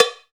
COWB, Cow Bells, Roland

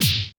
Korg 01W MegaDrums; drum machines

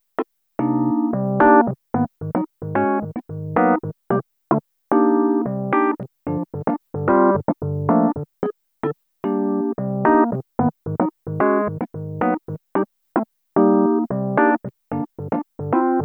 Dirty EP, Electro Lounge; Sonic Foundry (Sony Creative Software); 111 Hotty

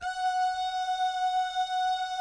DOO GUYS, Vocal Jazz FX

